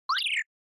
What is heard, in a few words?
Motion Slide Dry